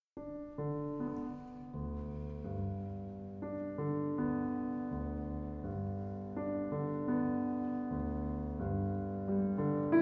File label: piano 5th mov; Shostakovich